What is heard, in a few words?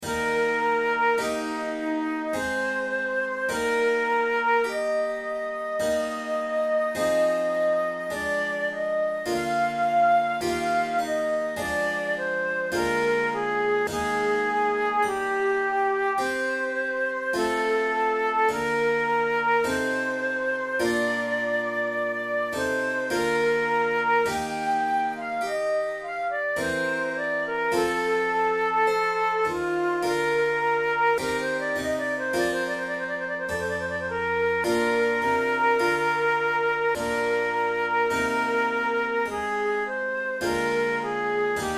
cello sonata largo; marcello op1 no4 iii